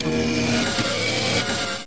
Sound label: attack loader Bot